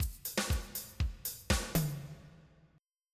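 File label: disco funk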